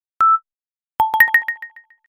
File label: tone